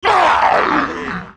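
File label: zombie damage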